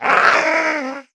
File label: troglodyte aggro